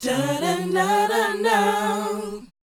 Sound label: BOYZ D#, Vocal Planet, R&B Dance; Spectrasonics Vocal Planet